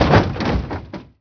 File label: SWIPE, Prangs